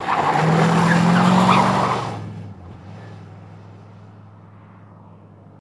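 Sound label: Cadillac Corner Skid With Motor; Cadillac 1972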